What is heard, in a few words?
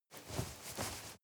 nylon leather very soft